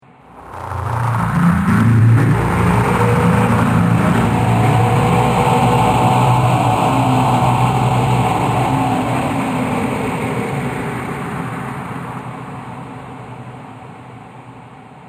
Space War Dark Power, science fiction sound effects